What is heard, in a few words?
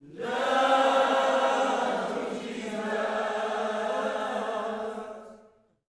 LOVE JESUS, Vocal Planet Gospel; Spectrasonics; GOSP PHR